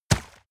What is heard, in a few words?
horse step water shallow